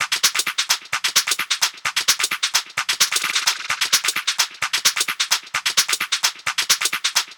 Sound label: Perc Loop 130, techno